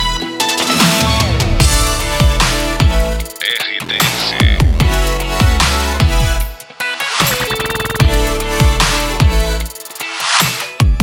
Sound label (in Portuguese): TRILHA PARA LOCUCAO FM; 107 FM